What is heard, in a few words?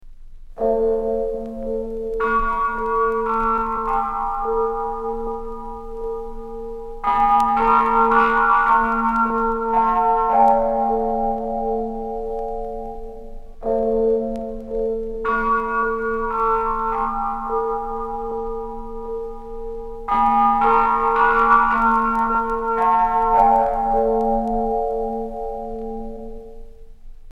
disturbed wrong